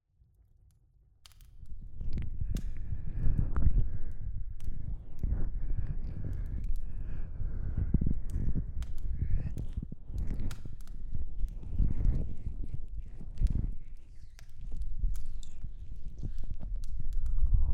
お耳のオイルマッサージ, ASMR; 遊びに来ました; 先行公開：オイル耳マッサージ

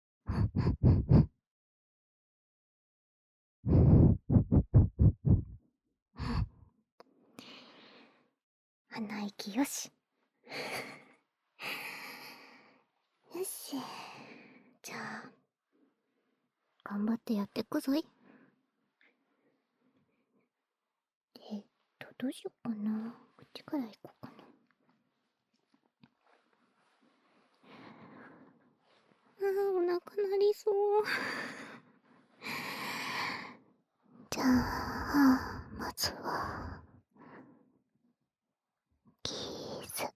耳舐め練習, ASMR

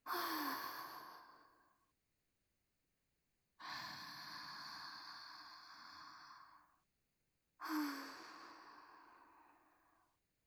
寝息（センター）鼻息

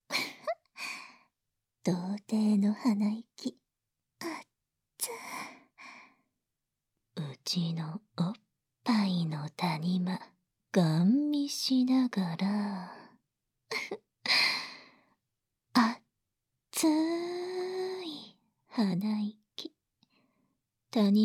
耳舐め手コキ&ねっとり濃厚フェラチオ; 効果音なし; 庇ってくれたお礼

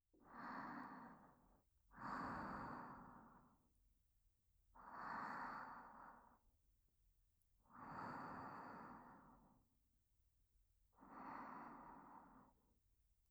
彼女の寝息; ASMR

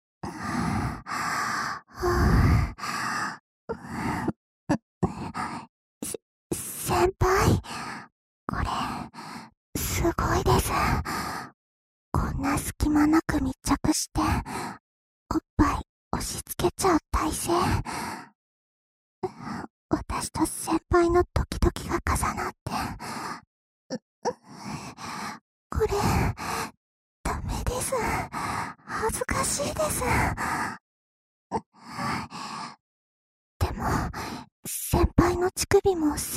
耳舐め天国『ぐぽぐぽ♪』『ふぅ～ふぅ～♪』『れろれろ♪』『じゅるじゅる♪』 noSE 耳塞ぎ; 耳塞ぎ舐めver

